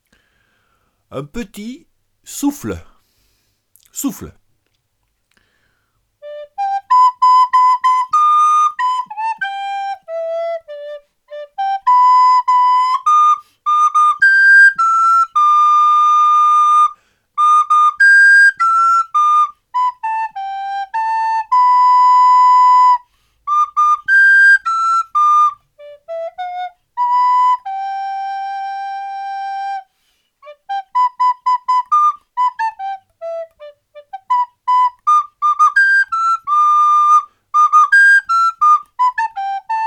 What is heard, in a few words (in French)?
souffle